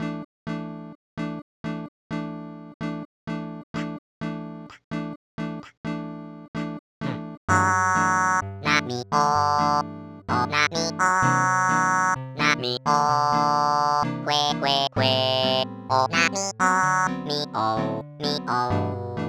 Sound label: Bossa (Live); animal crossing gc rip